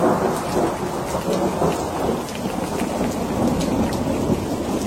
Relaxing Ringtone

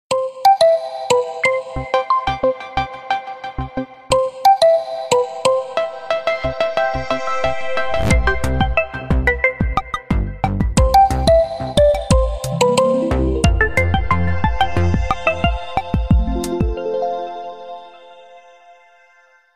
Infinix 8 Pro Ringtones

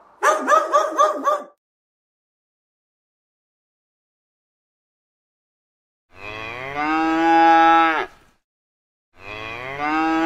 Animal Noises

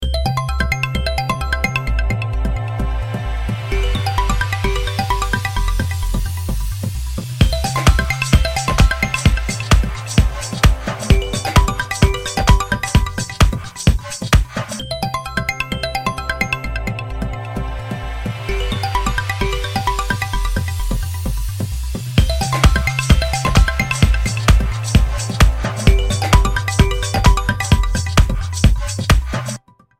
Infinix Ringtone

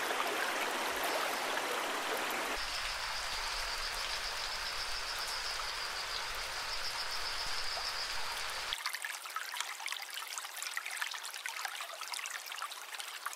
Water Sounds

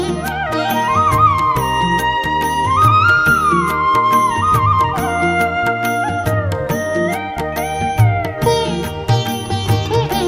Iphone Ringtone Flute

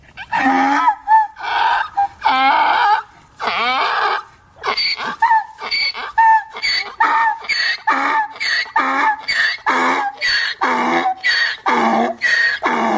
Donkey Sounds

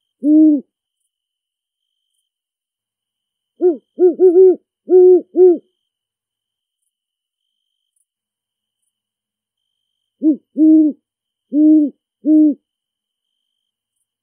Great Horned Owl Call

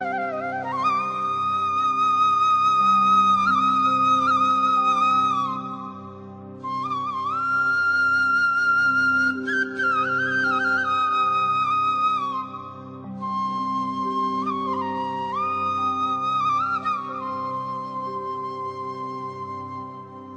Beautiful Flute Ringtone

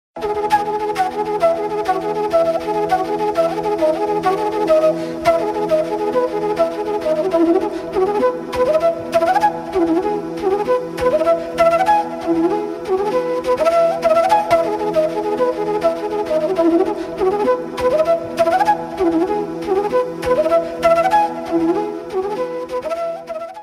Flute Ringtones

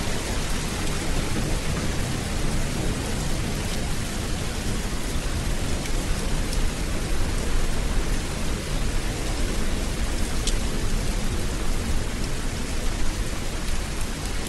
Relaxing Rain Sounds For Sleeping

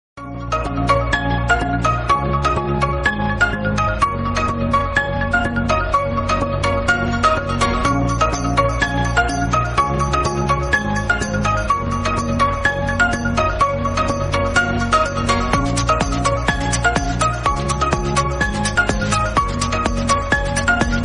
Infinix Hot 40 Ringtone

